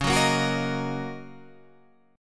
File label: Eb Minor Db; Ebm/Db strummed; JGuitar